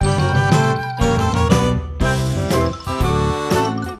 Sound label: strolling music